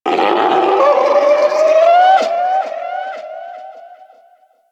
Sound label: Lure Call Success; Warframe; Hunting Critters; Orokin Kubrow